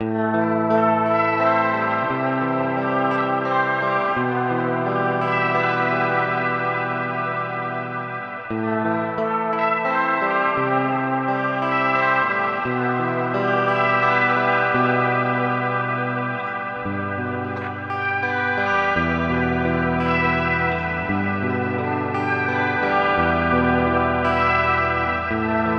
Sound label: Mooer R7 X2 Shimmer